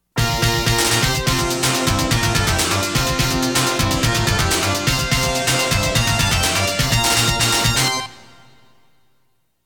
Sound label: Winning BGM